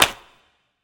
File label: clap; smite; horus